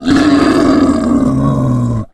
mboar death; STALKER; customnpcs

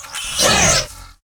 chimera idle, mutants; STALKER; customnpcs

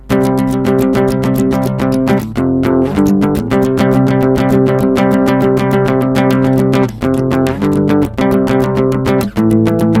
guitar; STALKER

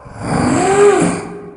karlik take hit; mutants; STALKER; customnpcs

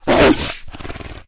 pdog make hit; mutants; STALKER; customnpcs

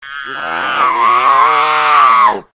cat die; STALKER; customnpcs

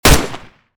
SG 552 fire; STALKER

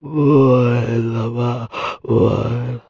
zombie death; STALKER; customnpcs

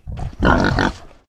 boar idle; STALKER; customnpcs

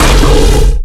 giant make hit; mutants; STALKER; customnpcs

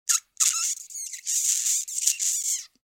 rat agroed; STALKER; customnpcs

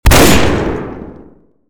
SPAS fire; STALKER

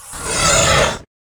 chimera make hit; mutants; STALKER; customnpcs

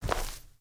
grass, step; STALKER; minecraft

